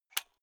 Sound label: unplug safety plug soft